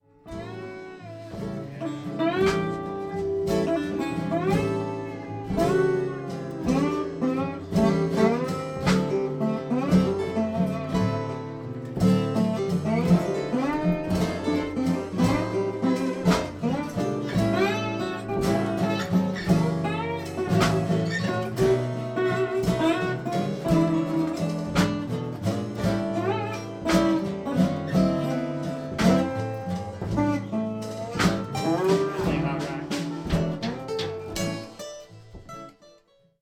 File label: zoom h2 blues jam